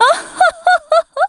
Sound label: ohoho; virgilia